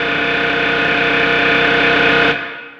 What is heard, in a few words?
X MACH, MACHINES, Production Effects; Best Service - XXL Production Effects